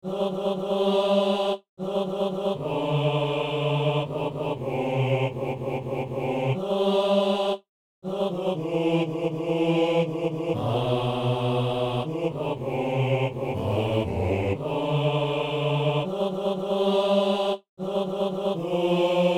basse

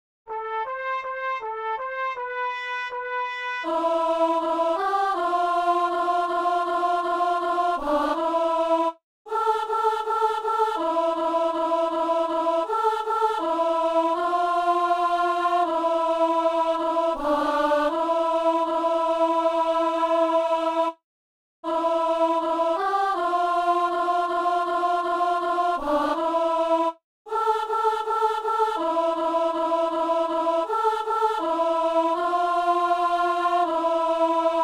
célébrant et alto; Messe de Saint Paul

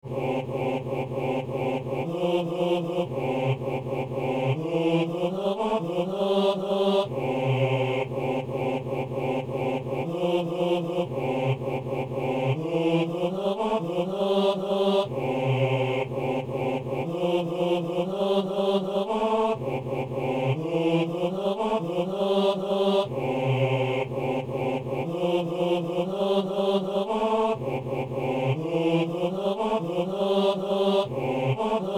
basse

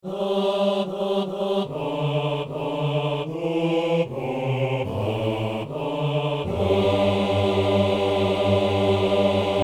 basse

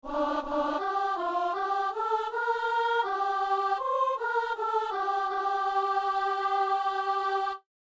psaume, dames

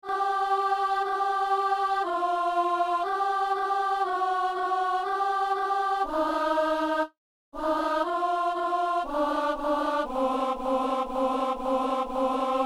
dames; pour soliste et choeur

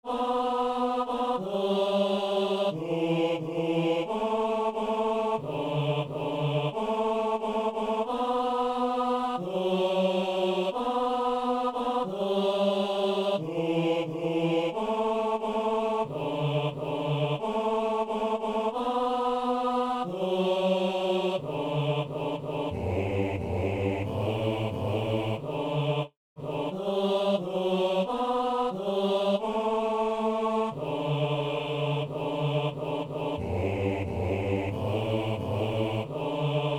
gloire a dieu paix aux hommes, basse

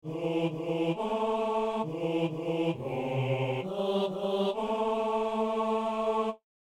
hommes; Psaume 26 (27); Messe du 17 janvier à Saint-Denis

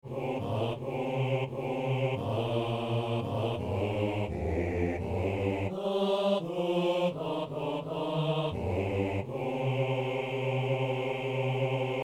basse; Psaume 66 (67)

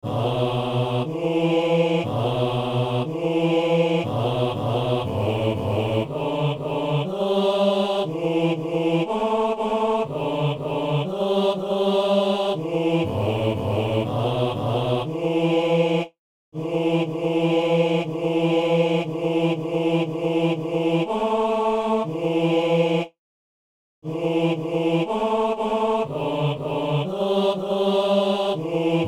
basse; Crucem tuam

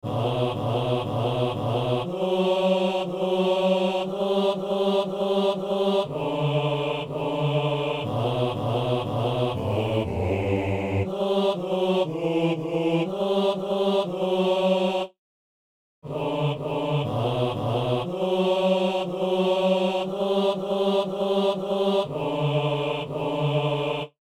basse

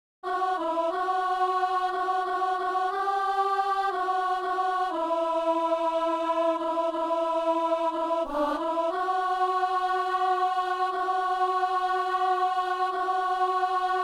soprano; Messe du 17 janvier à Saint-Denis

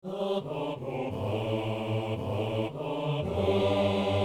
psaume, basse; Psaume 127 (128); André Gouzes

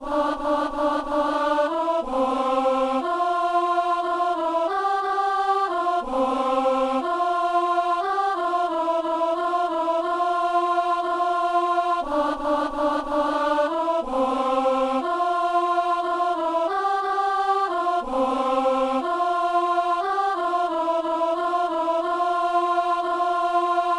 messe simple, gloria, partie a seule refrain, alto

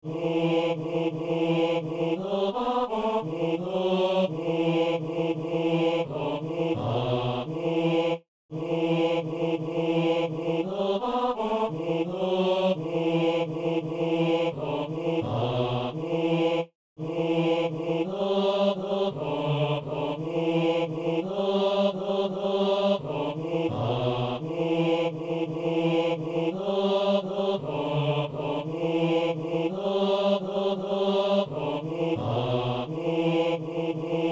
basse; Tourdion

